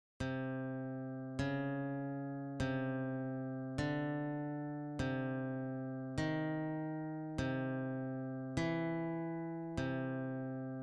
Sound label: intervals practice, guitar